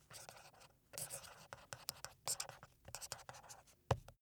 marker pen write paper sequence

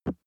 plastic cream bottle hit soft

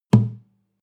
western guitar drum single low

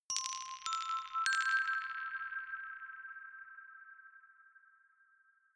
notification glockenspiel rattle triad up major

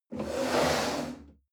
chair wood slide on floor hard

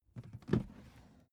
suitcase open case

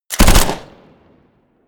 smg uzi 45 modern burst

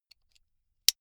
buckle plastic insert close soft

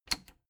plastic button latch loose click enable fast

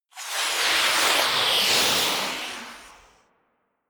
whoosh air

